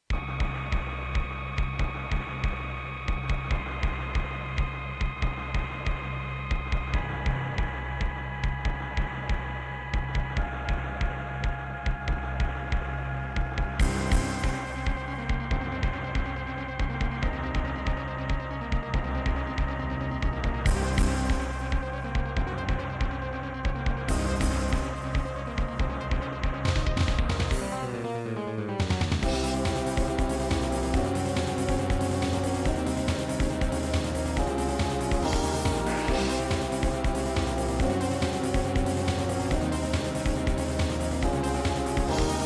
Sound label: Labway LWHA111800 (AdMos QS700)